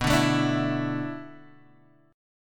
D Suspended 2nd B; strummed

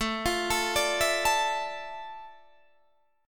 F Major 13th A, guitar chord

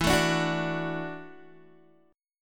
E 9th Suspended 4th E down Guitar; E9sus4 strummed

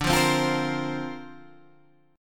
D-Minor 7th Sharp 5th D, chord

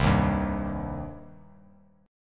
C Minor C down; strummed; JGuitar

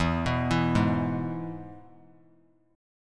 E-Major E; arpeggiated; JGuitar

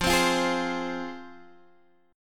F Minor, F, Guitar, Drop D; x,8,10,10,9,8

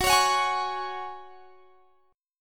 Gb Suspended 2nd; strummed

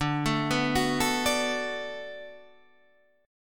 D 6th D down, chord

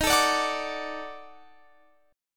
D sharp Minor Major 7th Flat 5th D sharp down

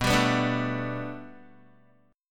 B Minor 7th Sharp 5th B Guitar, chord; Bm7#5 strummed

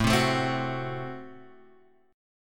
A Minor 6th, Guitar, Open G

